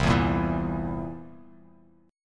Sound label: C 7th C down, C7; strummed; JGuitar